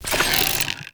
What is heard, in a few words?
Bow Draw; Grineer; Weapons